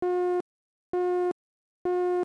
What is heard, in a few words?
Beep